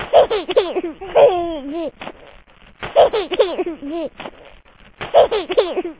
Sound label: baby giggle